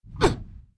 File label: swordman attack; propack